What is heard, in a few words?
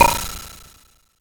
SMASH; ModelCycles